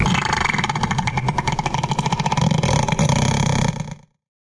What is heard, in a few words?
snarl; Barotraumatic; Crook